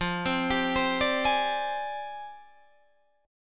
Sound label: F Minor 6th Add 9th Guitar Drop D; down; chord shape